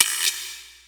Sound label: perc, Percussion; Trance Explosion Vol1